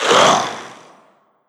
Ghost Scare; Legend of Keepers

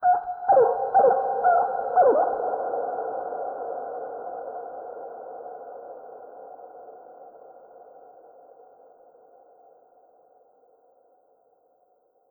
Animals; Legend of Keepers

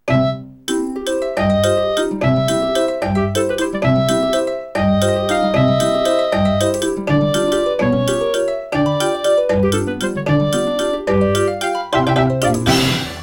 Musical Notes; Beach Bowl Galaxy Musical Notes; Super Mario Galaxy; Wii

